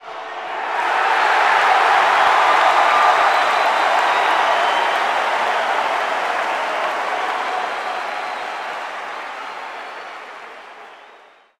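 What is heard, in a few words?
Basketball Crowd Cheer; SFX Kit; Crowds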